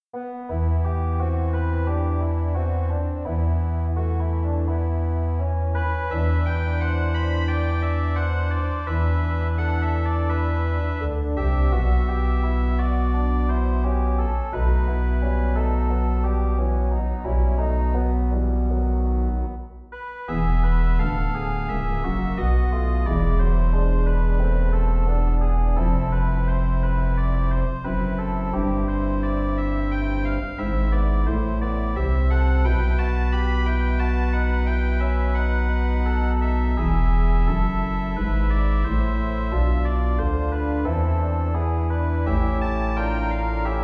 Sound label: Preludium e